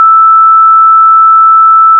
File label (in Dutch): tweede boventoon